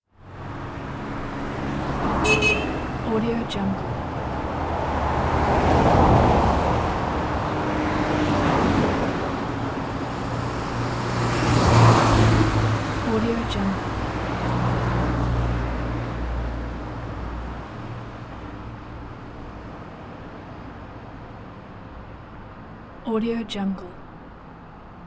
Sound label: traffic saratoga small town 25mph